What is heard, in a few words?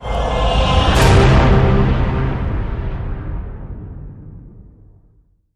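Hit Orchestral Cave Men C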